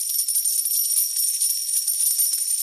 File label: lion Coins; godsoffortune